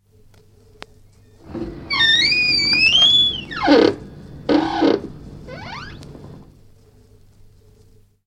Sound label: doors; bbc